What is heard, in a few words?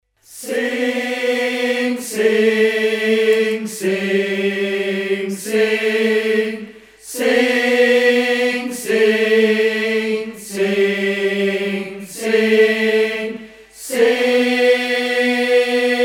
Tenor for end tag; Suffolk Song